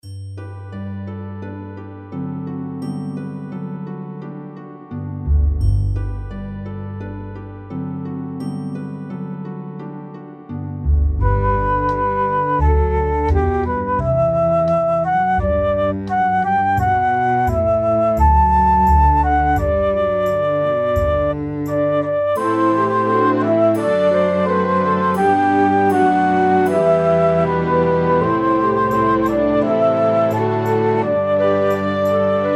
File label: serious show tune